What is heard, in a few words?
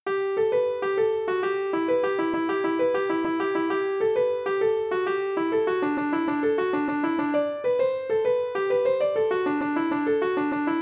irish jig; mixer; boeuf trad